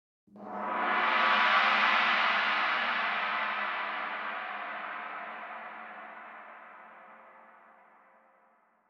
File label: Action Transition, Classic Horror Sci-Fi Music